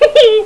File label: Napper Trap; Crystal Crazy Demo; Casady & Greene; Nasties; Shipnapper